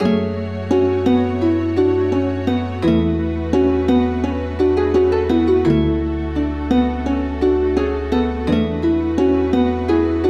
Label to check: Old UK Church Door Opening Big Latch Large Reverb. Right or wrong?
Wrong